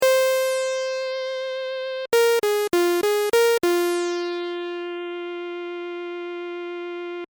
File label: Final Credits Synth